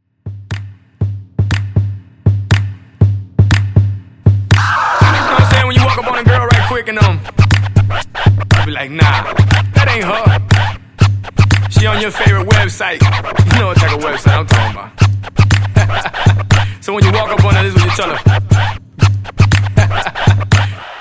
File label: Scratch ReDrum 120 Bpm